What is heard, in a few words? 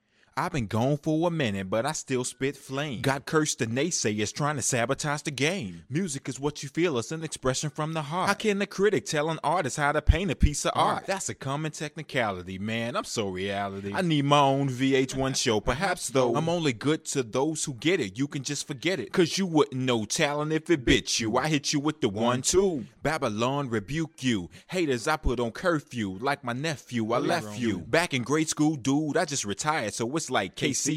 Rap